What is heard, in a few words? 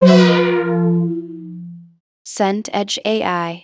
chord fail minor